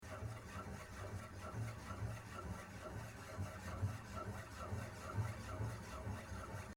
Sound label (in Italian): con legno regulier